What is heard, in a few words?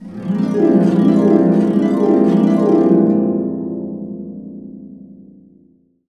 BB CASC UP DN, Harps